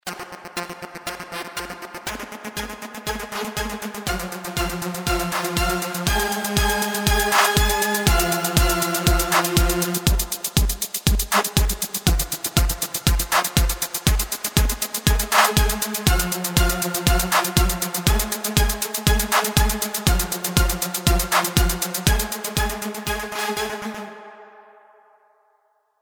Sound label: Metaverb; Plagiarism